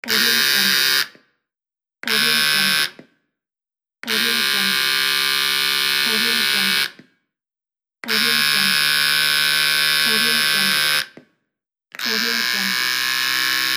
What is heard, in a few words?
hair cutting machine